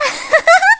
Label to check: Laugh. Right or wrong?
Right